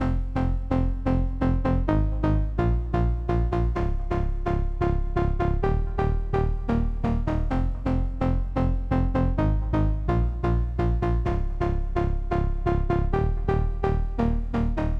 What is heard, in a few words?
128 BPM F Min Chords; House; Loop Stems; Cymatics; Breakdown Loops; Sunrise